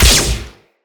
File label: Flak Explosion Small; Eximus; Blitz